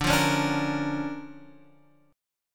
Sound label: D Minor Major 7th Sharp 5th D, guitar; x,5,3,6,3,6; DmM7#5 strummed